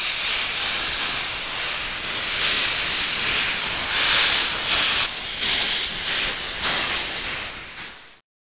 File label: Train